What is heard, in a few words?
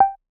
Short Tone UI